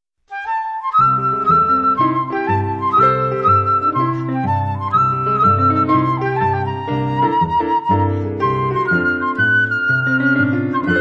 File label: chamber music trio